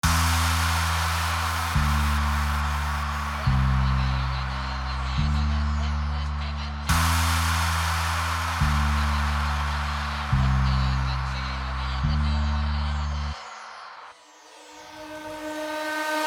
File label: Remix Hard Bass Mix